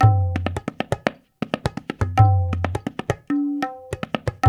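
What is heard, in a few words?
108 bpm, Teental tabla; Tabla Grvs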